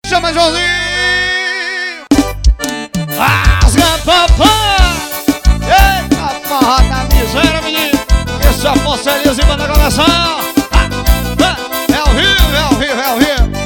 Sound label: clave solo